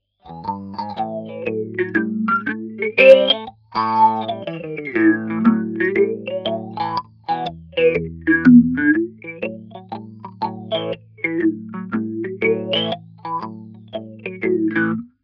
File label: phaser